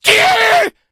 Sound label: become enraged, infected, male; left4dead; Source engine